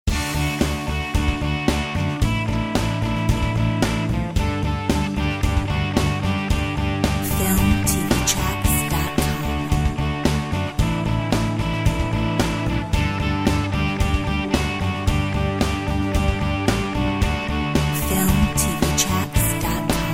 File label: Victorious rock